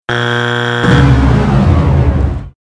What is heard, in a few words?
red buzzer; got talent final